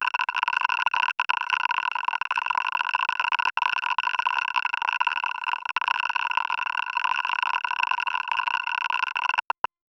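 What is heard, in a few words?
rhythmic mineral crystal, crystals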